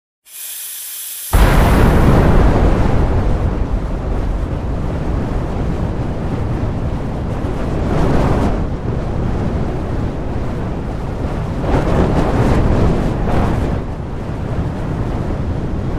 Gas Line

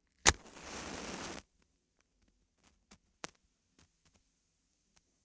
match strike

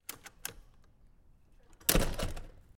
Small Metal Door Open

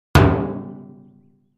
Metal 50 Gallon Drum, barrels buckets & cans

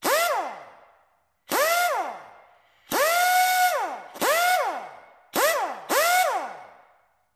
Wrench Pneumatic

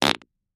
Close Up Fart

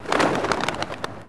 Bird Wing Soft Flaps

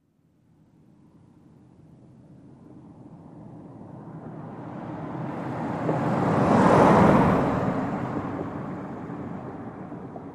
Sedan By RT 25mph

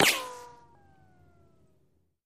Bullet Ricochet Sin

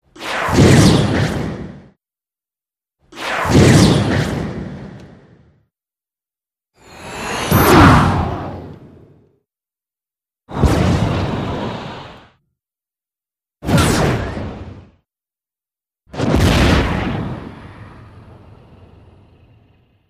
Explosive Impacts, whooshes

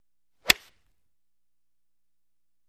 Golf Tee Off

